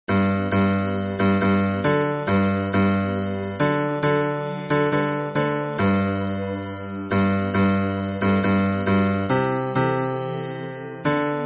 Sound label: hymn bass